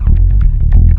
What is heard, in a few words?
LOW BASS; Big Beat Samples & More